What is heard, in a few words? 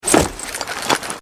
foley scramble; cod4; openwarfare